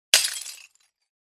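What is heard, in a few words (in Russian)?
разбилось что-то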